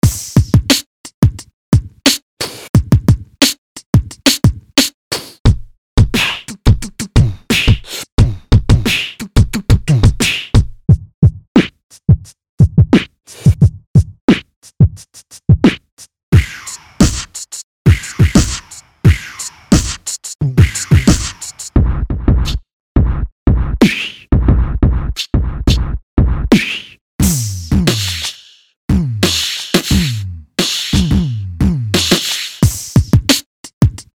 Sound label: Beatbox